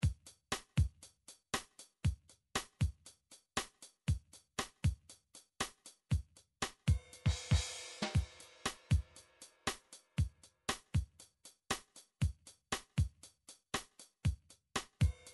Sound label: drum midi raggae